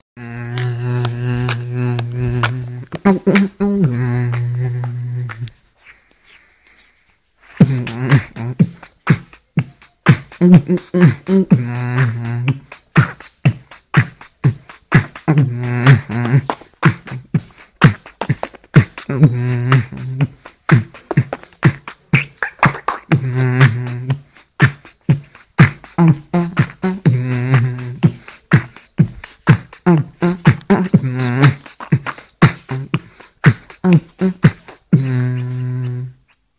my beatbox

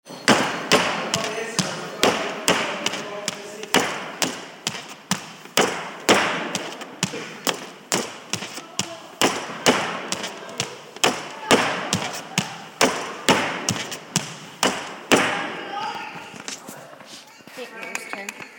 step ups

